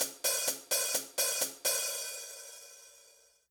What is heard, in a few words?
hat loop tail